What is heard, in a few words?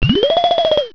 pain; quake2; tourney; battlebrowzer